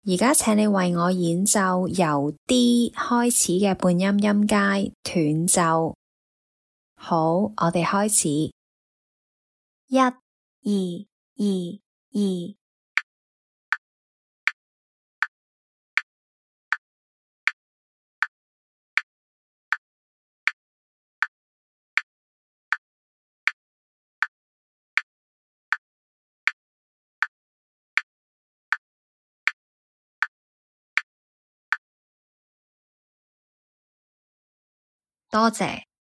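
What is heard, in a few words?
chromatic scale starting D staccato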